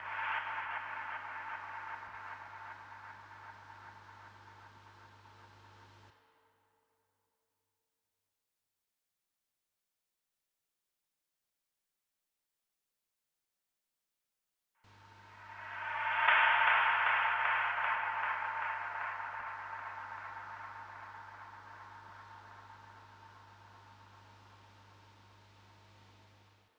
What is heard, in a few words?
Drum Loop - 118 BPM Impact; Loop Stems; Cymatics; Pacha Drum Loop